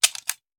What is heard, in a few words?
Machine Gun Foley; Sound Ideas; Guns